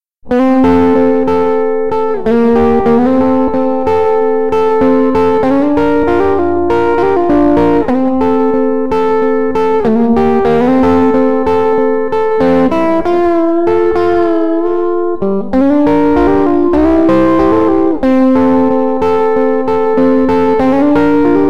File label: soft distortion